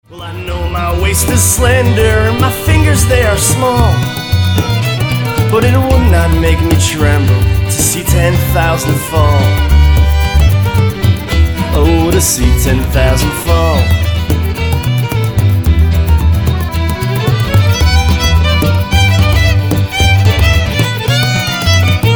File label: Irish Band; Milwaukee Irish Band